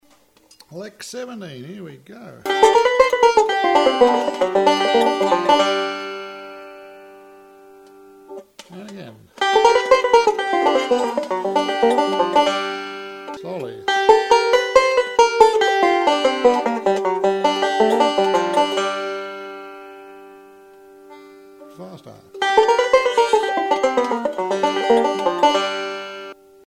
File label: banjo lick, bluegrass banjo